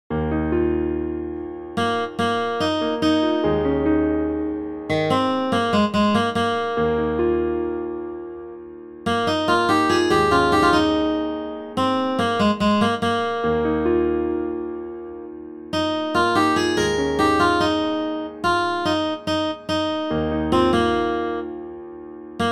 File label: accomp melody